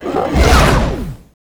T Gunner attack

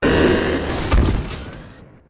Gunner die

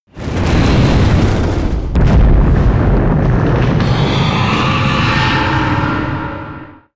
HOLY STONE KEEPER war create

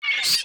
Hopper Peace idle

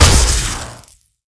Plasmagun Shell, Damage

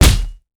Staff Scale, Damage

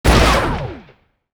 beam gun; Weapon

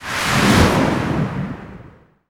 Block

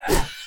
attack; Mob

